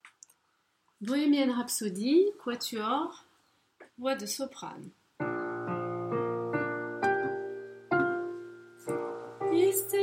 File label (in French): quatuor soprano